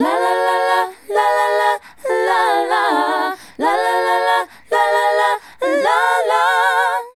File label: Female Group, Hip-Hop and RnB Vocals